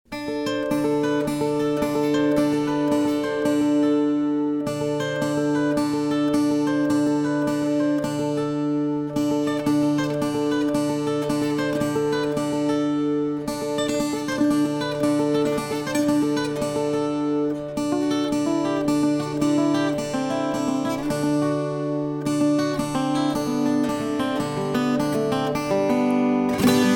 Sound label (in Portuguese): celeiro instrumental